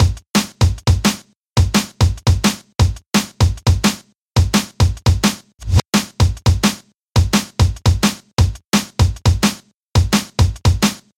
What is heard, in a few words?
Jump Up Jungle Loop